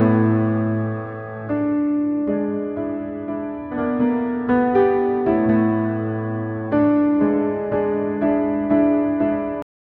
sad piano

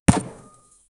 modern archery bow shot; arrow release

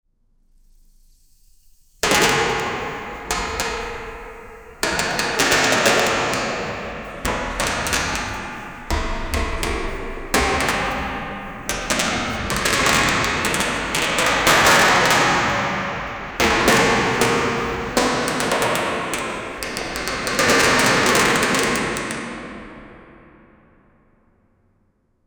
boxes falling from

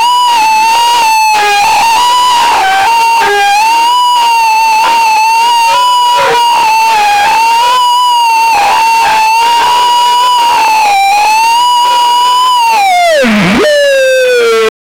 lion aaaaaaaaaaaa, wildlife

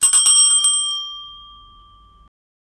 short sound of a bell; announcements

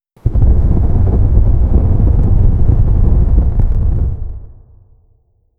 bonfire in a dungeon; burning

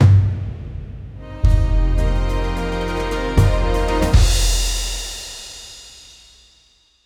epic cinematic hero intro, orchestral

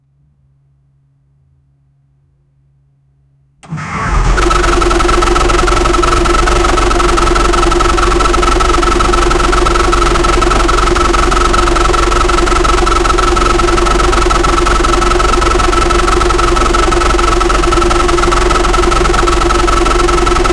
boat engine sound; sound effect

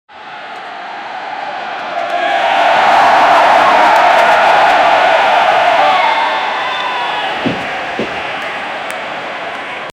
the sounds of a baseball

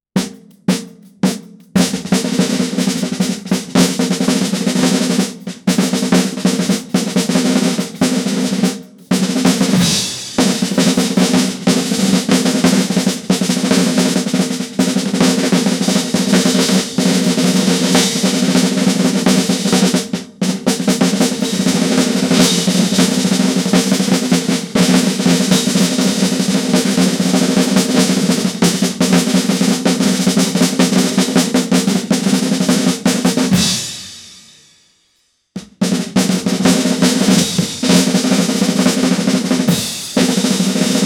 an realistic buzz roll, percussion

